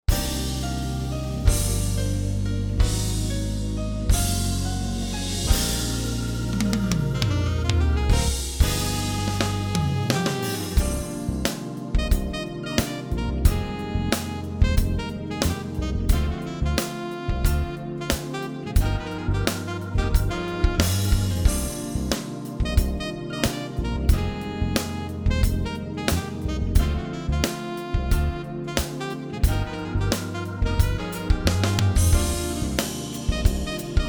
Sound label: sonic kit; surReal